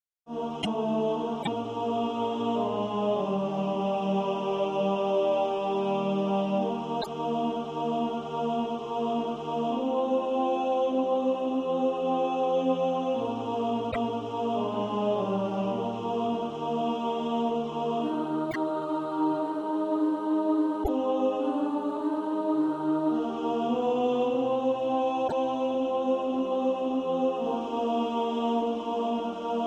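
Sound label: tenor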